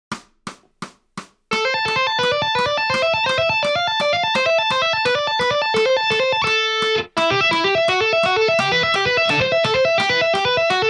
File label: Lick